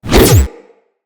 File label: Blaster Punch Swing; Tenno